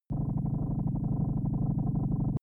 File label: rusty machine room